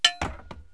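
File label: small metal drop, cartoon; Wired for Sound Pro CD; Aristosoft, Inc.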